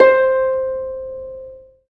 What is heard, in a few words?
STR HARP, STR Orchestral; Sound & Vision; Gigapack I CD 2